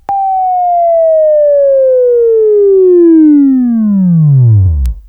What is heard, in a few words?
WAV Right, Sweeping; Labview-Program; Sweeping Training program